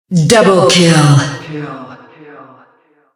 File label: music stinger; killstreak music